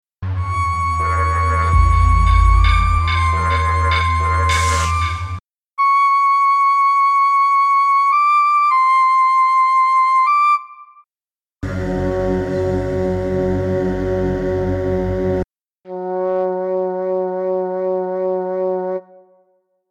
Flute

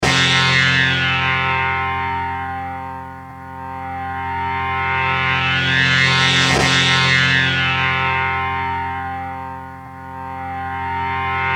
Sitar loop

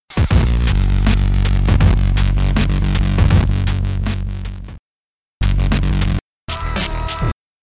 Beat fragment

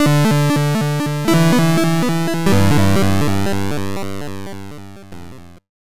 Square Synth Echo Long

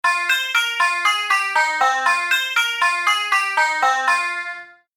Synth

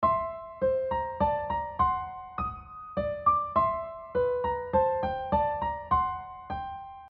c dorian c lydian

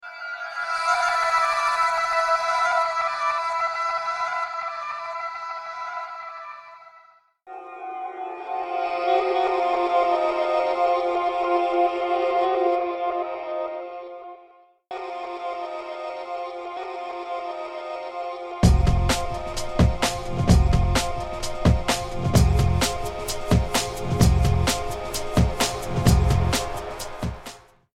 Ethnic ambience loop

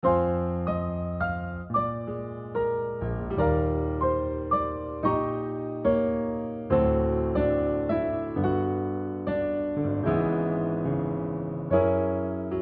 multi tonic systems

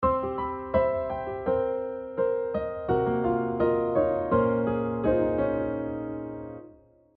altered common tone modulation

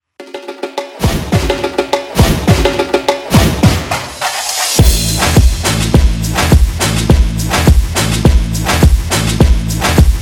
samba

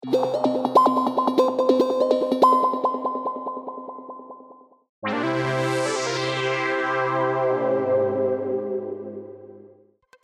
Ringtones

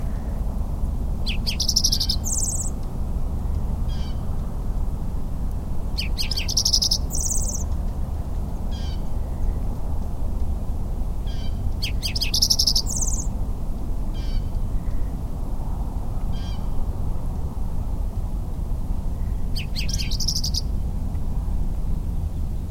towhee

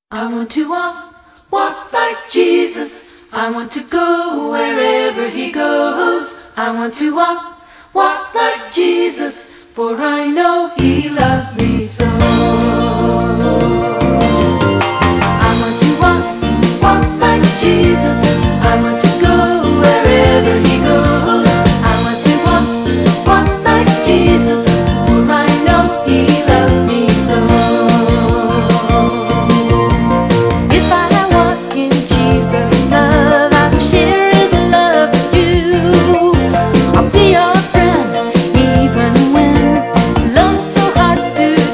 Kindergarten

